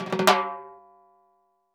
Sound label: Timbales; Percussion